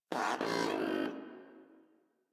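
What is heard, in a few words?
Moa Aggressive Vocal Enemy Killed; Warframe; Pets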